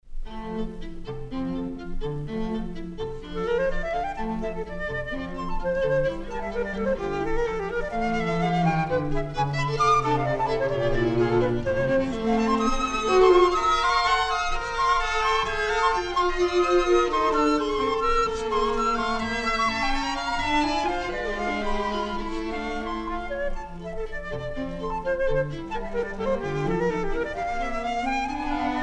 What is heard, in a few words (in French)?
Trio pour flûte alto et violoncelle Allegro grazioso; ROUSSEL; op. 40